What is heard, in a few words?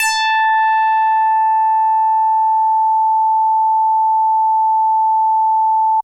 Synthe Bass DX100 A6